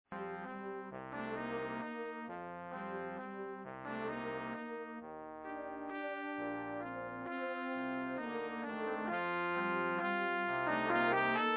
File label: Divisions for Brass; Divisions for Brass quartet or quintet; Computer realized performance; Derek Healey